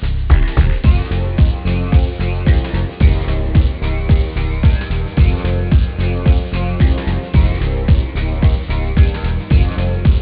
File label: trance synth chords